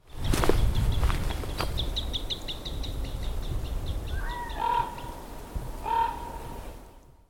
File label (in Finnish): viklon var; linnut